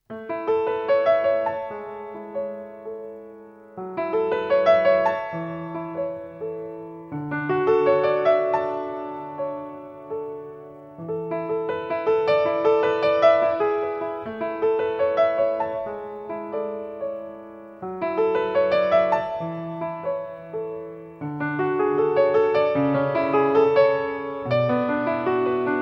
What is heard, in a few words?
ocean song extract, piano solos